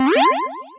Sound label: reward; LG GW820 eXpo